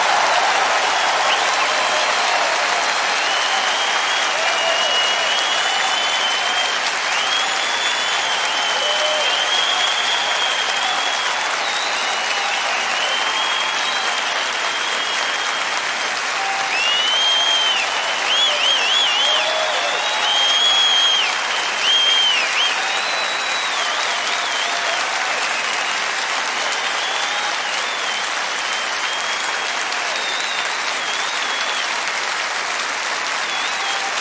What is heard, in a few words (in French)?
Applaudissements Et Présentation